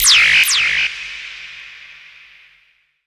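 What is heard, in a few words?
synth, Production Effects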